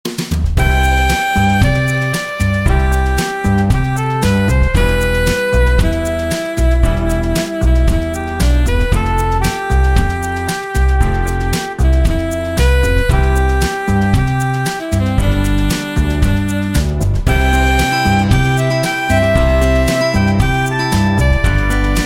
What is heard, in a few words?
jazz pop mix